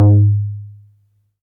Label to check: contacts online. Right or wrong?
Wrong